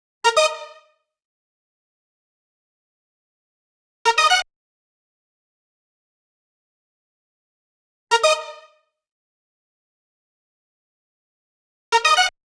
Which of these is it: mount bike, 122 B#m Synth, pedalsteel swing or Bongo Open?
122 B#m Synth